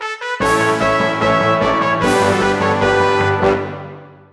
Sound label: Worms!, Fanfare; 1997 MMX LAN PACK (win 95); Worms Armageddon